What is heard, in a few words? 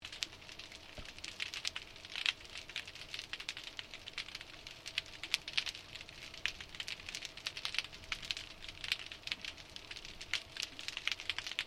UNDERWATER CRILL CLICKING LOOP, The sound of tiny creatues in the ocean